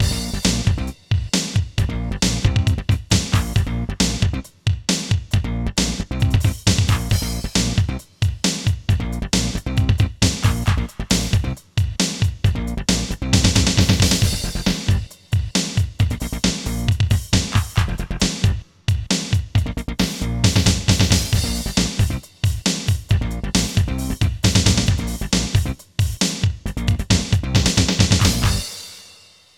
Planet Bass; Gazillionaire Deluxe

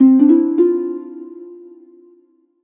Solo Harp Positive; MUSIC EFFECT; startide

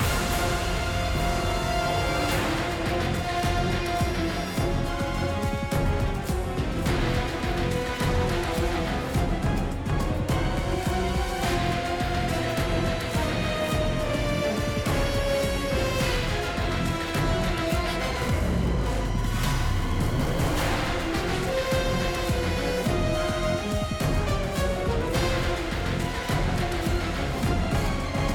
Battle Boss Desert Phase

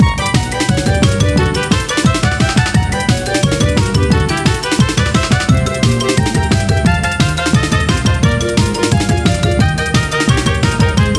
Hamster Race Music; Birthday Party Bash; Wii; Spanish

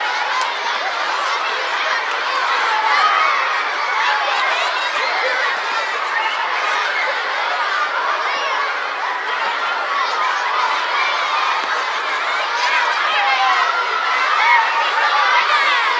ambience, kids amb; birthday party bash; wii

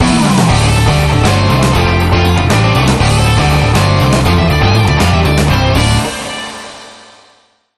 Level win; novadrome; xbox 360

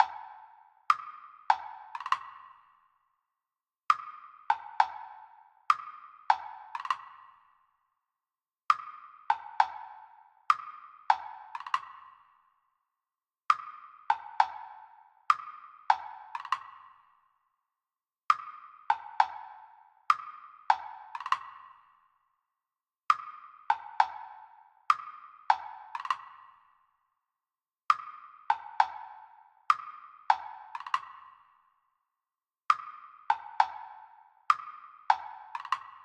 Perc; Toki Tori 2

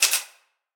Bar Graph Inc Type; Prison Architect

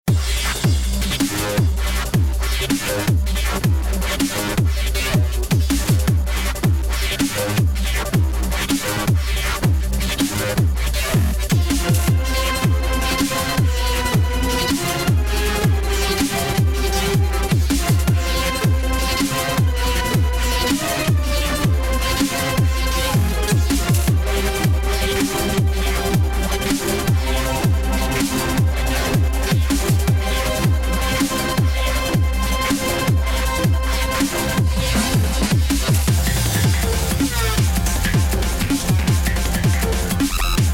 Boss Loop; ICEY

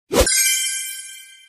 Quest Clear; qualification of hero collecting princesses ios gamerip 2016

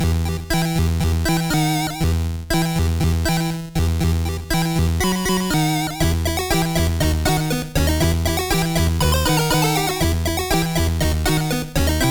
music kingdom treasury loop

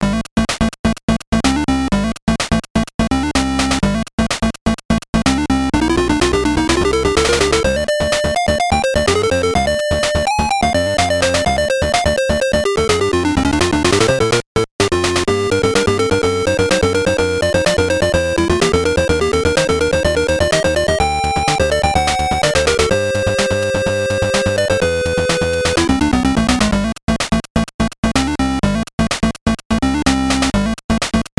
BGM; Fire Pro Wrestling; WonderSwan 2000

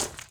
Footsteps Gravel Run

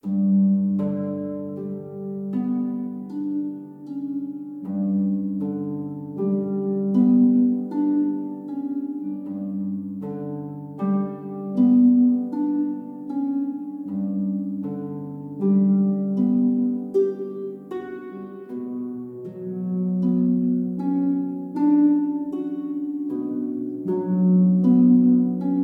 Ambient Harp Stem; ps4 ps5 switch windows xbox one xbox series xs

